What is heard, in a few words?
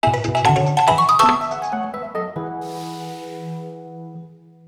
Hard Challenge Unlocked; Picross X Picbits vs Uzboross